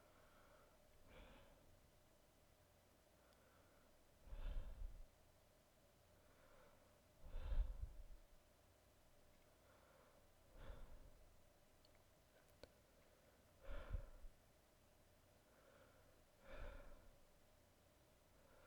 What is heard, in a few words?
breathing